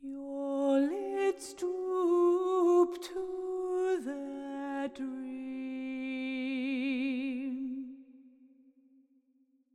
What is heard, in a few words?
Hush, Lullay, vocal phrase